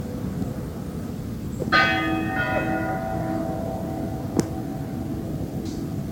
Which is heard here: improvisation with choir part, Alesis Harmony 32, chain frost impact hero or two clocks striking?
two clocks striking